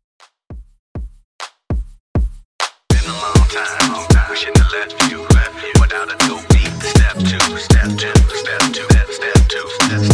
Dancehall Remix Redrum

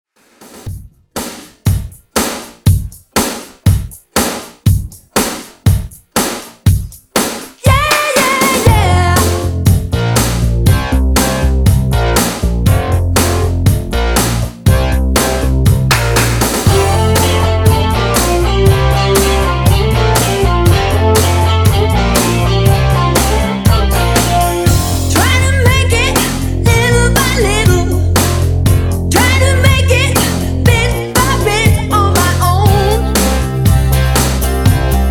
Re drum

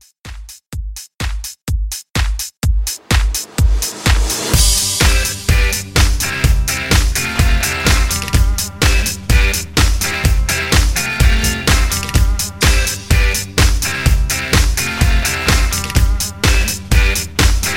70s Classic Rock Re Drum

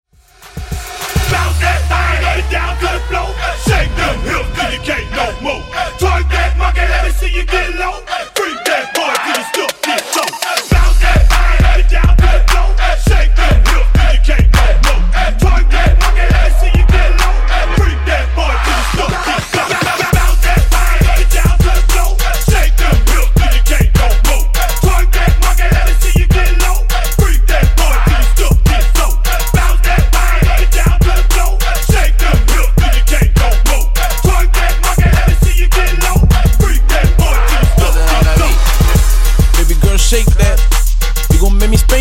Slam 102Bpm

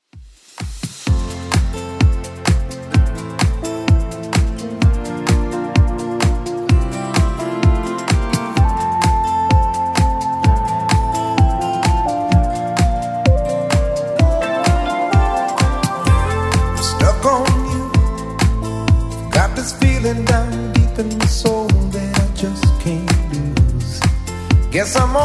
80s Club Edit 128 bpm

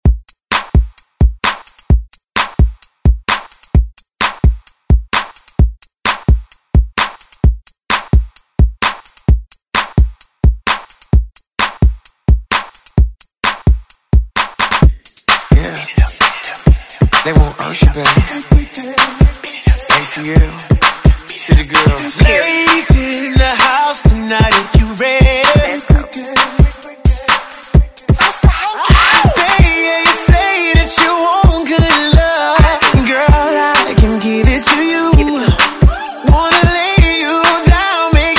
Top40 Redrum

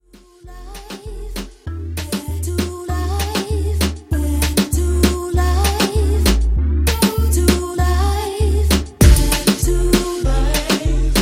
Mombahton Intro Outro; Remixfreakz

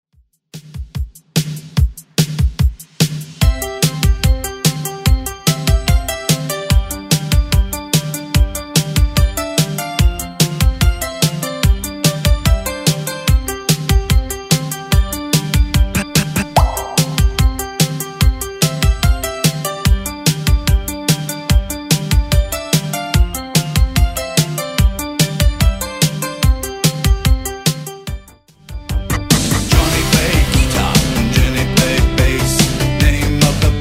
80s Rock Redrum 146bpm

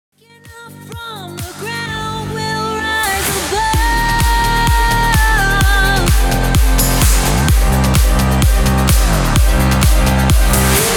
RISE ABOVE PROGRESSIVE CUT 128 BPM

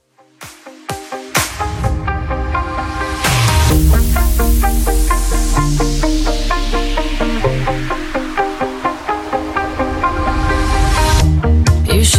Top40 House Reworkz 128bpm

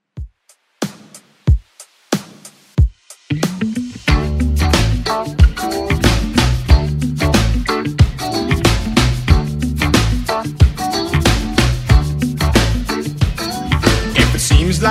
80s Redrum 92bpm

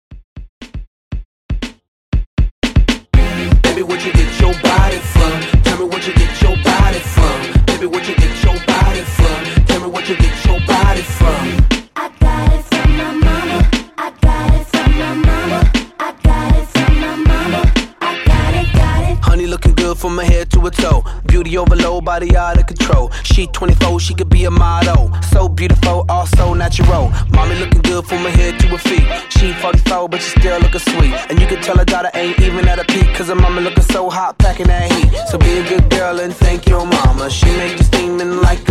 Ext; Redrum

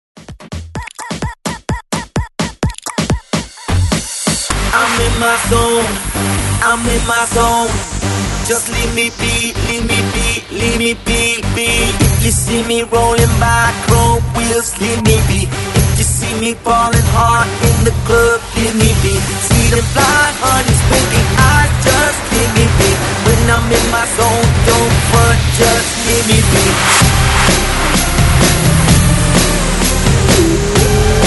In My Zone 128 BREAKMIX SMASHER

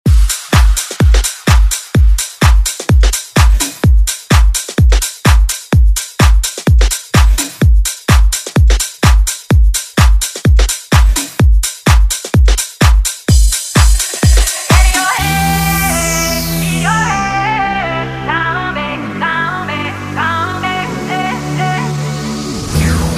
Dance Redrum

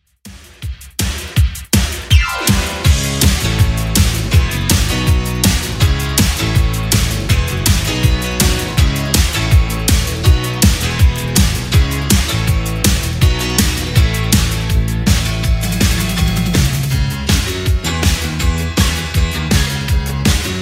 80s Redrum 162bpm

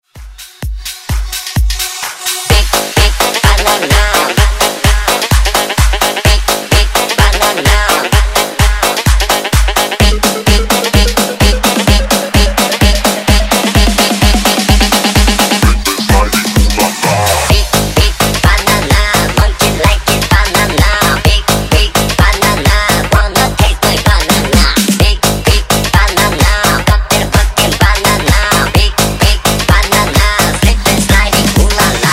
Dance Redrum

